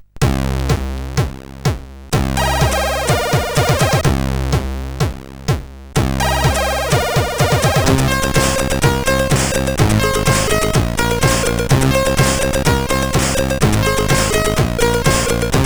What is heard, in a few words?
Frogs Sea, sid CSG8580R5